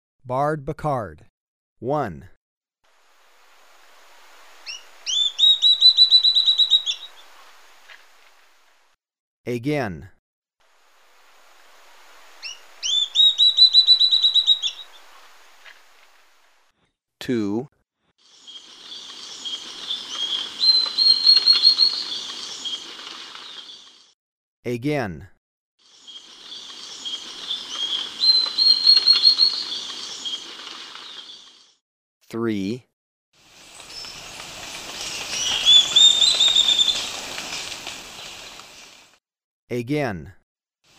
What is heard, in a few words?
Barred Becard, Birds of Ecuador; S. America